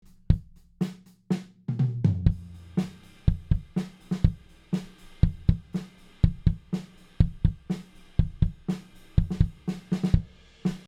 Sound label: SSL SiX CH Kick SM7B with Compressor